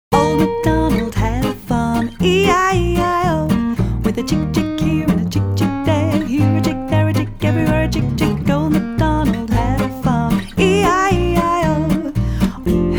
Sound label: jazz nursery rhymes